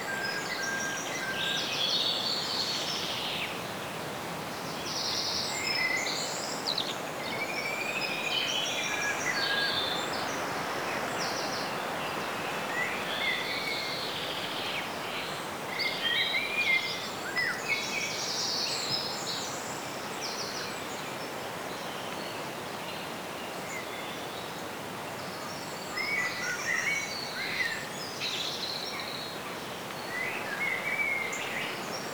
Ambience Loop, Exterior

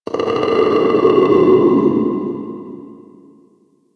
Infested Runner Dist Vocal; Warframe; Runners

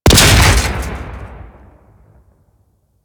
Target Explosion C; Warframe; Ambience; Tenno Challenges

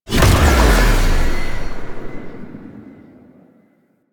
Impiety Stop; Warframe; Warframes; Dagath

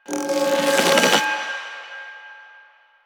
Choir Bow Charge; Warframe; Weapons

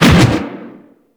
Fist Whoosh; Warframe; Weapons; Archwing; Melee; Regor Fists

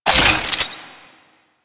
Fulmin Prime Secondary Switch 3D, Weapons; Warframe

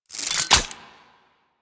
Revolver Rifle Reload; Warframe; Tenno

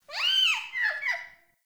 Freed Beaver Call; Encounters; Animal Rescue

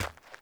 Walk Gravel, Footsteps; Enemy; Mockeries; Mockery

